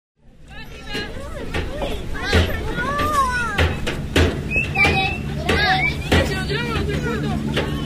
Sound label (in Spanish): parques ninos jugando en juegos infantiles; parques norte; Rosario